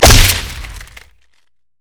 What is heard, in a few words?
stacked deck; LoL; twistedfate